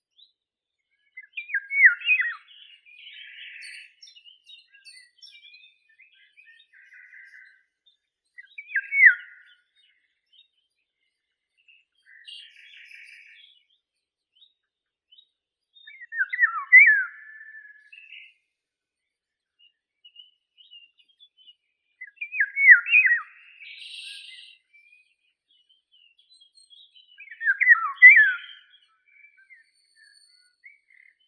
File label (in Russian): ivolga obiknovennaya oriolus oriolus